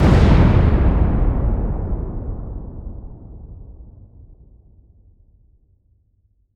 Explosion Demolition Large Deep Blast Dynamite; Fire and Explosions

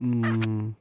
Human Kiss Sexy Moan Kiss Short

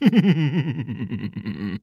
Cartoon Human Male Giggle Evil Giggle

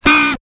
Multimedia Internet CD-Rom Flash Beep Mechanical

